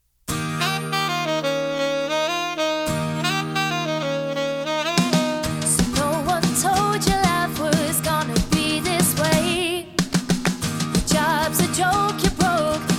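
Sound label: quartet, Acoustic Duo, Trio and Quartet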